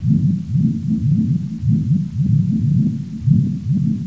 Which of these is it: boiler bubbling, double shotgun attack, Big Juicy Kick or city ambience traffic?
boiler bubbling